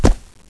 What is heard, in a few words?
tile; valve